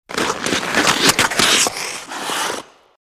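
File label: Hockey Fast Skate Run, hockey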